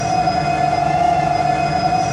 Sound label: Motor, Train; R110A